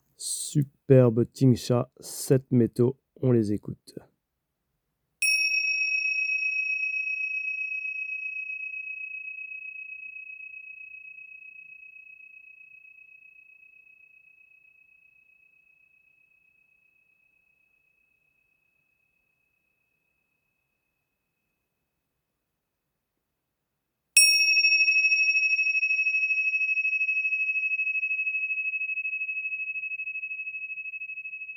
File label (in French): Tingshas tibetaines 7 metaux 77 mm Note Mi